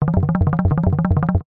cartoon run or shake fast rhythmic wooden heavy low pitched; zapsplat